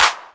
handclap, 808 drum machines

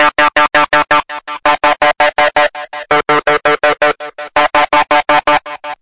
DRUM LOOPS and BREAKS, 165 bpm; 161 to 180 bpm

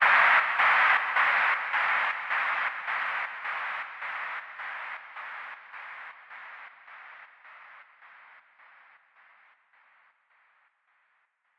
noise echo out; Atmospherics

